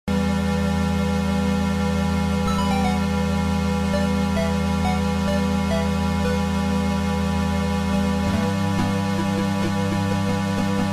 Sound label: cms; monkey